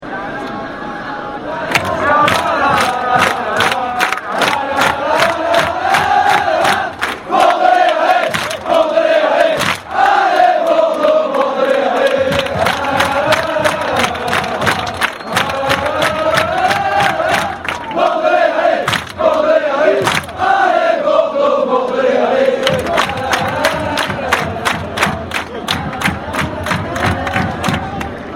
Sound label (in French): Allez Bordeaux Bordelais Allez, Chants